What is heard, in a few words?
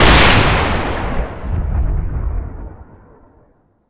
sniper rifle